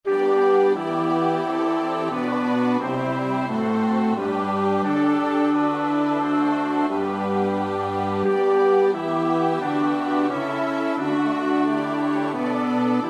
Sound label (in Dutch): bas; Bach (Johann Sebastiaan); BWV 079